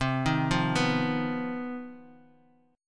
C Minor Major 7th Double Flat 5th C down Guitar 4F 4A 5C 5G 5C 6E; arpeggiated; JGuitar